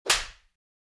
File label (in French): Baffes